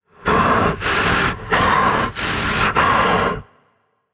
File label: breath; smite; voicepack; set